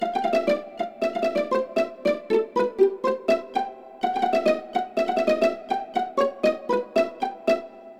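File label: violin pizzicato; Smeshariki Chiko's Computer; Skripka Piz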